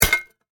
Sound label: Grapple Impact, Grapple, Impact, Concrete; Warfare; Miscellaneous Combat; BlastWave